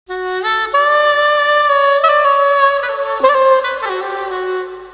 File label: depressing rock music